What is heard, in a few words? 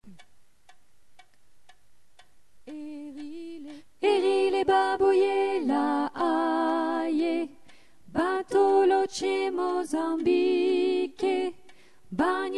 alto